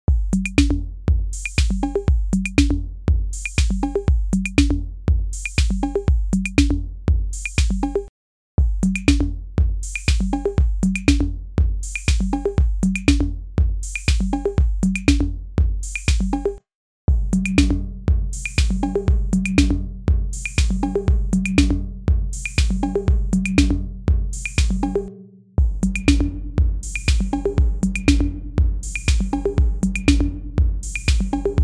QRS Drum Loop; SAVANT AUDIO LABS

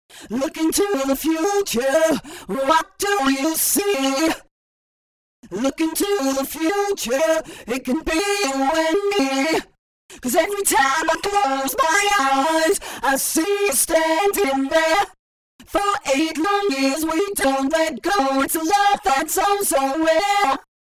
Box Lead Play Pattern Arpeggio Up; Antares; Autotune EFX; Jeff Rona

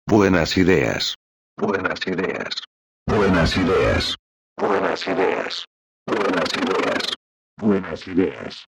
Rotary CLS-222 Vox; ARTURIA

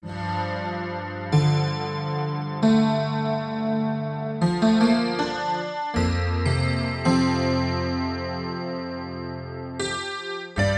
Piano Glass Grand Impro

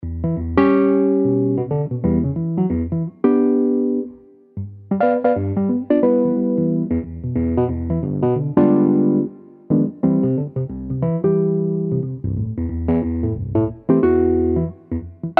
EZKeys Electric 200A

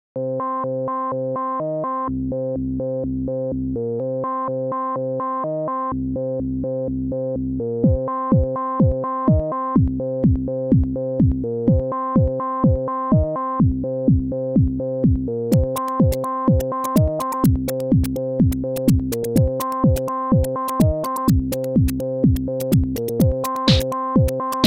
Bass Line 101; TAL

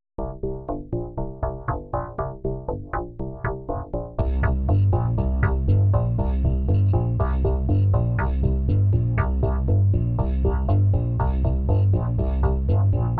DX7; VIRTUAL BASSIST 2; ARTURIA

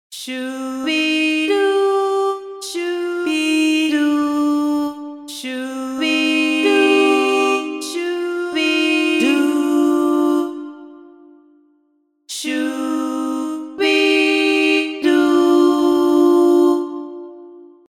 no legato

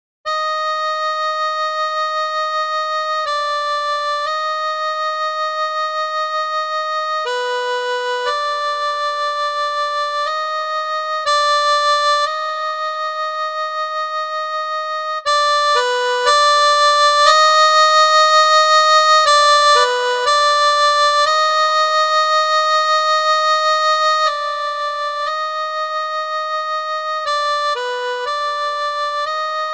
Harmonica; HalionSonic LE

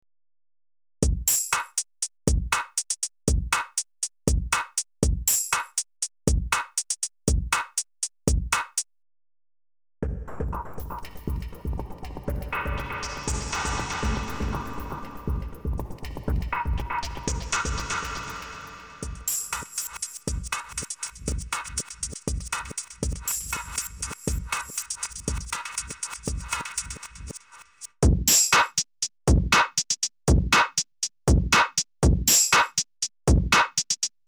Drumloop; UJAM FINISHER FLUXX

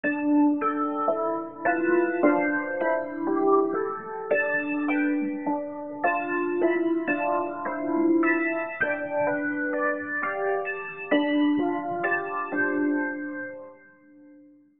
Perc FX Bell Ambience tonal

